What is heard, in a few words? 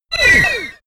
sci-fi laser shot